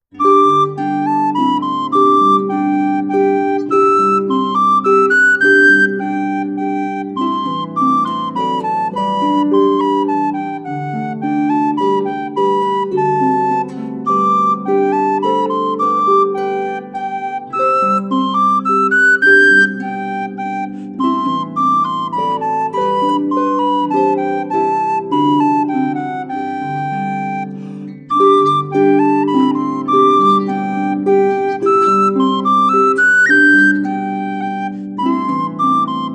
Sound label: menuet